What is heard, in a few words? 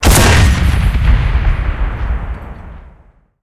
cannon 85mm zis c53 shot, cannons tanks; War Thunder